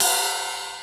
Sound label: GATED RIDE, MPC-60 DRUMS; Greytsounds VOL-1